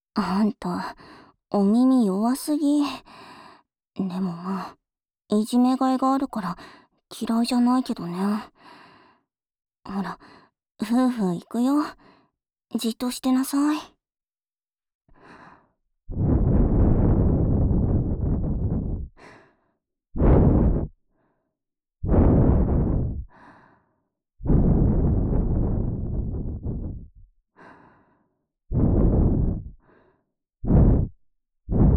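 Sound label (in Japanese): 耳吹き（右）, ASMR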